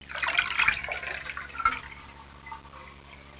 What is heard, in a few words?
WATER; WAV Sound Effects; houshold; bathroom